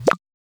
Grab

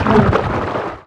Fish Monster Death Roar; Mars Sortie

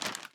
Footstep Fertilizer

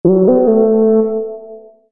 Character Horn

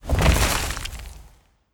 Debris; Death

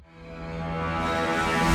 Battle

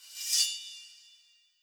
Sword; Barbarian

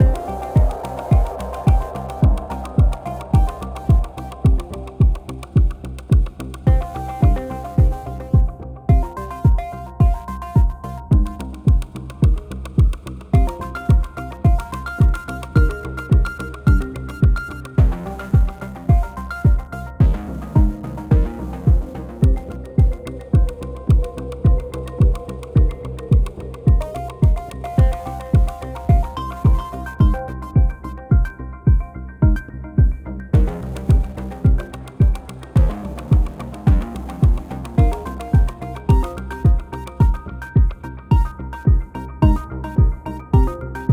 Hacking Loops 108 Bpm

